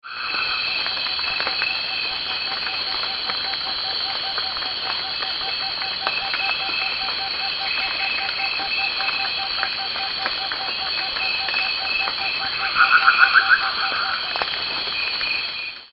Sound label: rufescent screech-owl, Mindo lodge long